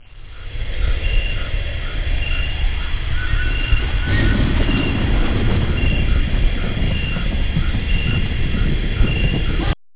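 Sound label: environment; Pamela Anderson Interactive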